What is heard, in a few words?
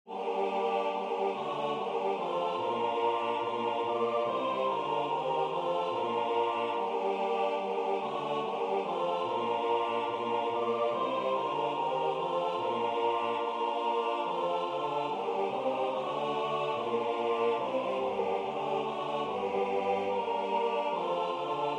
pavane SATB; arbeau; Belle qui tiens ma vie